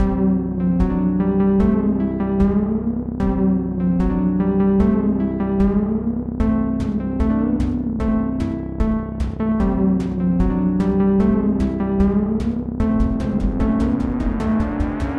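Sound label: Spooky Paced, game music; Project Vanni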